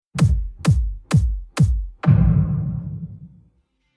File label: edm kick